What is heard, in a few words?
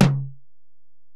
Tom, Alesis DM-PRO